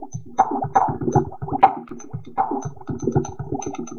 Water Drum, Acoustic Beats; Sonic Foundry (Sony Creative Software); Myths of Technology

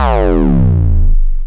BASS, Drum & Bass, 124 BPM; Ueberschall; Drum & Bass Flash Trackz

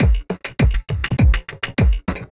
Beats, Synchro Funk

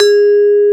VIBRA F4; AKAI S-Series CD-ROM Sound Library VOL-2

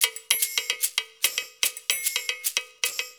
150 MIX, Percussion Loops

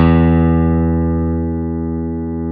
CP70

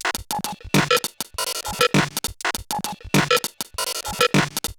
Bent RX17 (100BPM), Circuit Bent

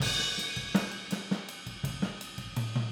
Boogaloo Fill Overhead ST, 82 BPM, Drums

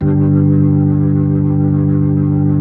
B-3

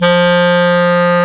WND CLAR2, Clar Jazz; Roland LCDP04 Orchestral Winds; 90 s Sample CDs; Clarinets

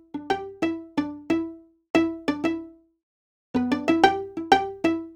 strings, analog funk; Mixed Berry Jam; Sonic Foundry (Sony Creative Software) - What it Is! 70s Analog Funk

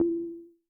Perc Reso, Vermona Mono15 Drums; Stereo layers

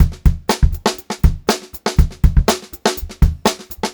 121 FK BEAT R, Funk-Groove Drumloops; USB Soundscan vol.38; AKAI; FUNKY

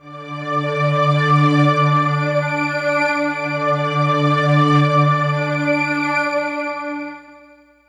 synth, Hardcore Breakbeat 152 Dmin; Big Fish Audio; Hardcore Breakbeat Action; Gone Insane

